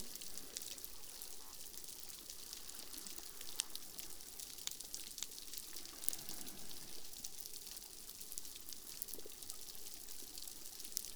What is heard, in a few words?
reef sounds; Xylofagou Skopia